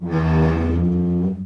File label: Pipe Groan; Home & Office; Sony Pictures Sound Effects Series